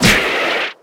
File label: hit